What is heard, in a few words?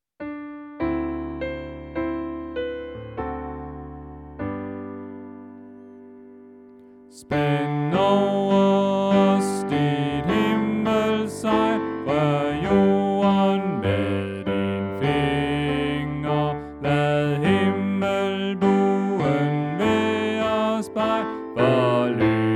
Bas; oevefiler